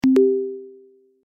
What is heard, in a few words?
login sound